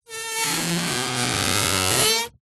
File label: plastic tape stretched rub creak squeak; foley; zapsplat